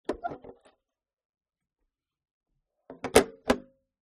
siemens fg stat 23a handset picked up and set down roughly in cradle; 1956; Sound Ex Machina